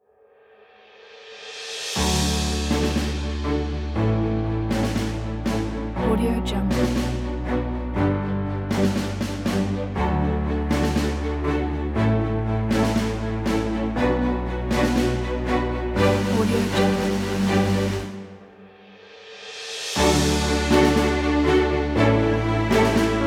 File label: orchestra and drums kit